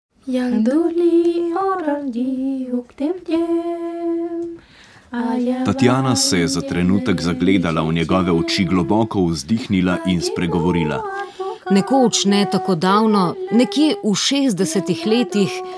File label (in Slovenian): jingel; TU PA TAM